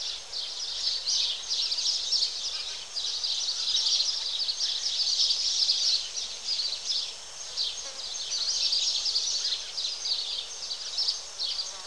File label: env city; HG2DEMO; PC Gamer IT CD 38